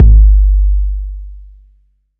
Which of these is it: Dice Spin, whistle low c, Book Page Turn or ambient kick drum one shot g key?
ambient kick drum one shot g key